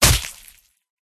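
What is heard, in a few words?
manticore attack contact bottom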